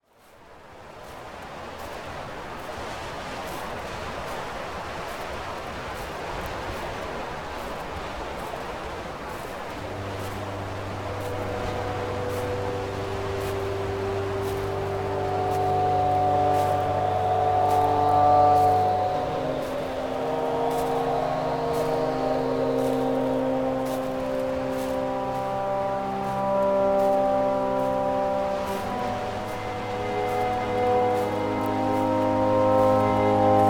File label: winter ambiance, music